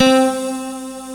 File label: nylon, JD8 synth